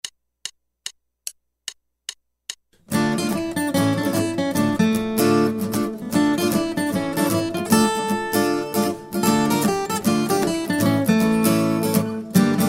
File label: Guitar